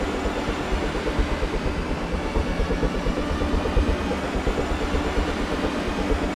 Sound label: Music Losing Loops; Battlefield 3